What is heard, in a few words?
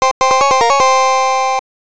Floor Start; Babel no Tou; x68000